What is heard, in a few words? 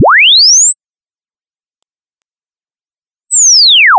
lin sweep 2x down; sample rate conversion down